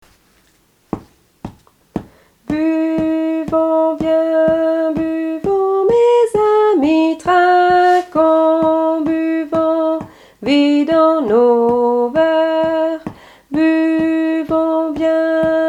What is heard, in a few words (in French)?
Tourdion Basse chant